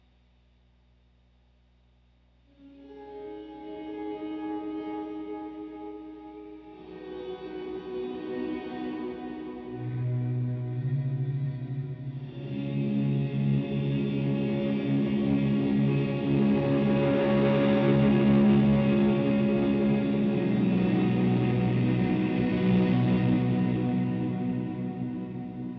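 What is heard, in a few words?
square drone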